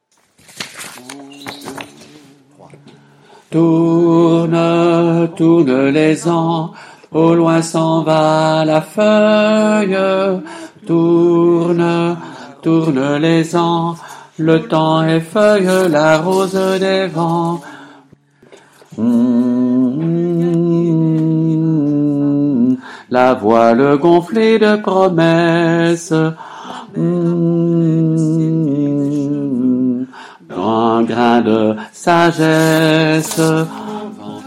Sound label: ronde altos, chorale